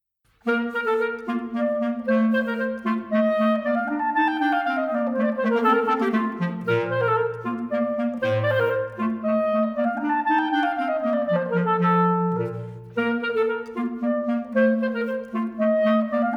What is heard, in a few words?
CLARINET CLASSICA - Duo KV 487 Polonaise